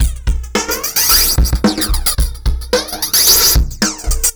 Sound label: R&B Drums